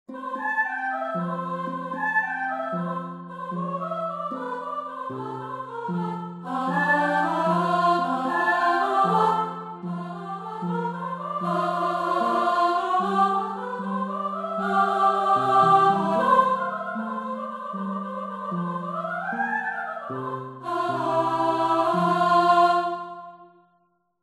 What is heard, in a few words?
Choir Bits Sop